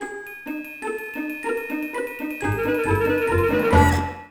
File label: Tension